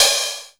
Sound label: hat, drum; Masterbits - Total Zone